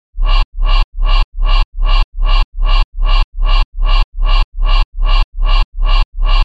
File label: Reverse Bass Loop C (150 BPM)